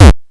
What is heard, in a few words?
boss hit; jokingyou